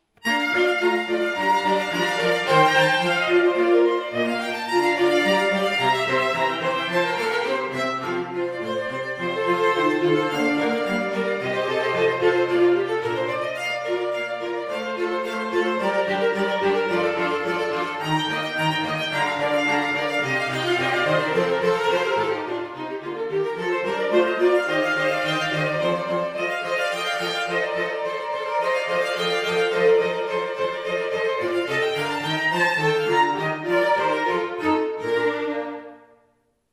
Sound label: Quartet